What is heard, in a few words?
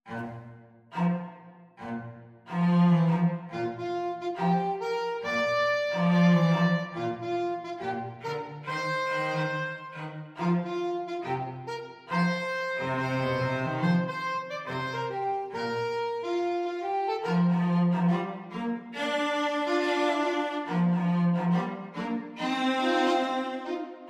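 alto sax cello; saxophone-cello duet; tom dooley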